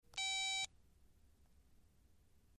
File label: Telephone; Cell Phone Connect Key Beep.